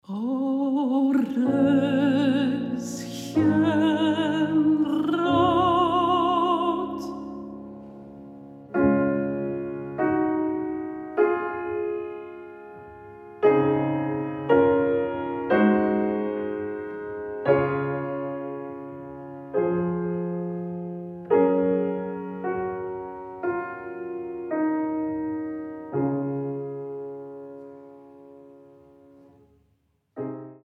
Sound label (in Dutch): zang en piano; Gustav Mahler